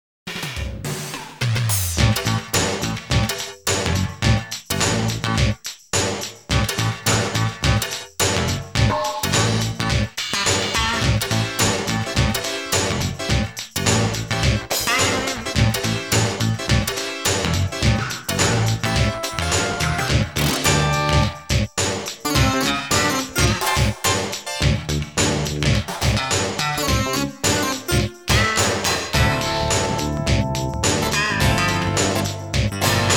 effect set behind you, Drums & Bass Fusion Funk Effect; Sound Sampling Collection VOL-5